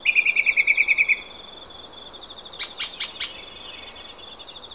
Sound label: night bird; EnvSound